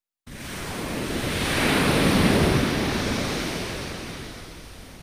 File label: wave synth